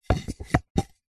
wood piece small x2 movements; foley

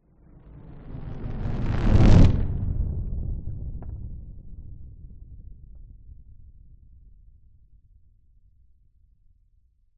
nature fireball pass by large designed

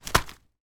household nappy bag pack place down on surface

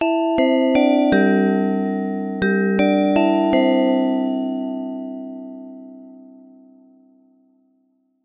musical big ben chime synthesized detuned bells; zapsplat

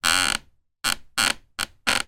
office chair creak; zapsplat

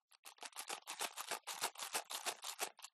Coins Shake Rattle Plastic Cup; PMSFX

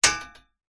impacts metal hollow frame hit clunk

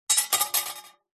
warfare sword small set down on hard surface

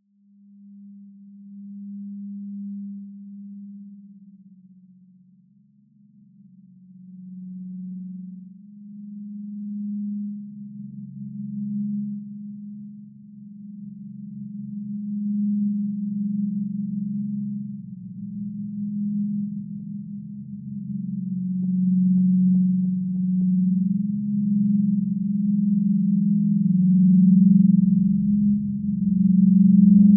Drone Sci Fi Abstract Rich Vibrating Dissonant; Skyes Audio

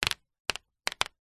nature water drips sudden short paper sheet

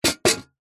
teapot metal old set down on hard surface; household; zapsplat

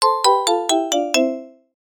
cartoon descend fall mallet x6

